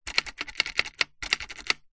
household telephone vintage rotary style pressing switchhook hook fast; disconected